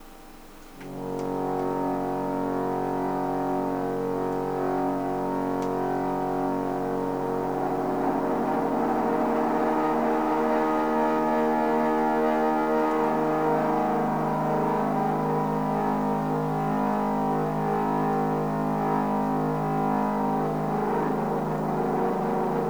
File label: Ambience; Ambience for April